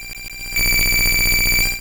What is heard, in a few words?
Hypnosis; Pokemon; Gen 2; attack moves; GSC